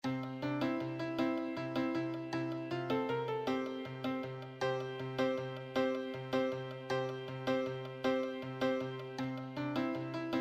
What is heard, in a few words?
solo, piano solo